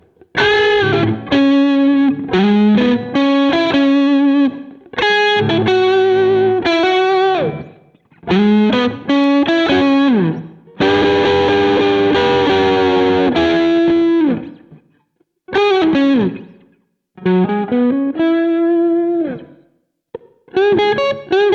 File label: bluesy